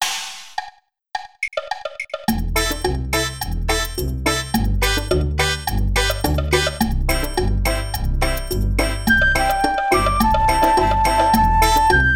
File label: Chinese melody Ya Yue 7 tones